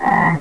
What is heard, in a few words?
Crank; Buttons